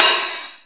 subtle reverb open hi hat one shot f key, open hat sample tuned to the F note